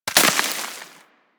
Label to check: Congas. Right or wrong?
Wrong